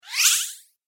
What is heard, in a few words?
Whoosh Sharp Swipe